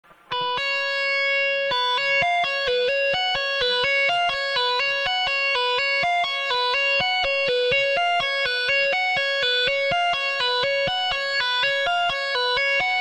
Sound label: tap